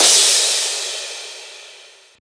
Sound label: Crash